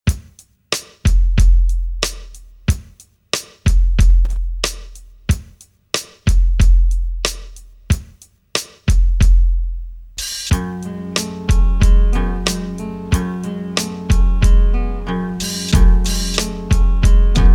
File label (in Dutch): Ideaal - instrumentaal